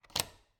Cabin, front door, deadbolt, lock, latch, thick, close, strong, wide; Eiravaein Works; Vaeyan IV